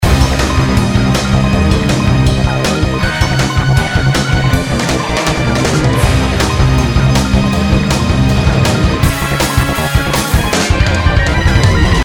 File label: loop